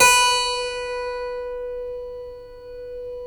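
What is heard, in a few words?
B 3 clavinet, Keyboards Acoustic; AKAI; USB Soundscan vol.17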